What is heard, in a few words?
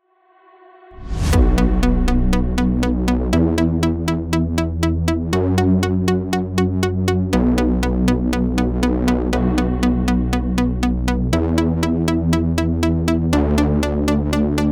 slap house; פלייבק